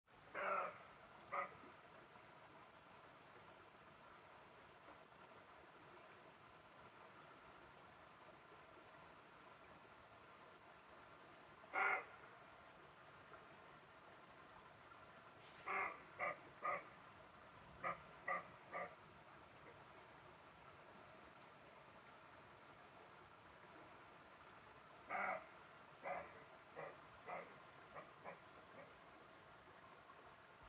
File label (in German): fuchs bellt